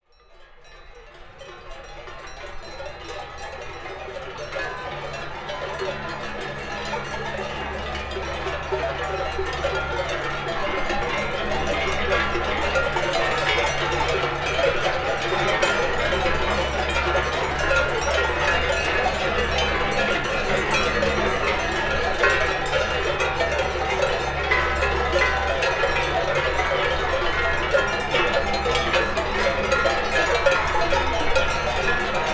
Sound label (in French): Artouste Cloches Vaches